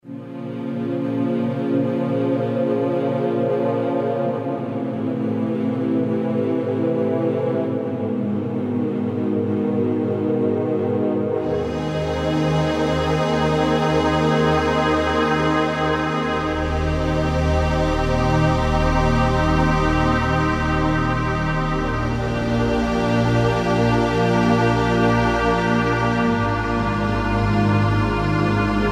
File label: pad, Kurzweil K2000; Kurzweil K2000 Value Pack; Extra Programs